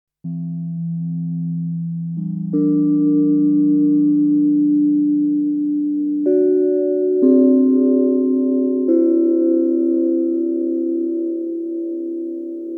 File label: Virtual Dream Bells, Thick Glass